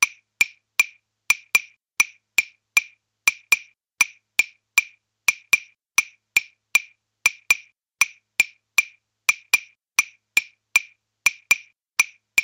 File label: Batucada Claves